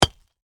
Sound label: Impact Ice Block, Hammer; Miscellaneous Impact; BlastWave